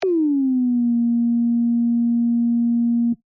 Oscillator Descend, Scifi, Oscillator, Descend, Science, Fiction; Sci Fi Sound Effects; BlastWave